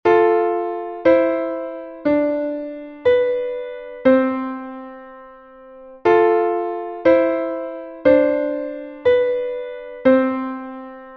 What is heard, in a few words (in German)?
gebunden; dommer 1865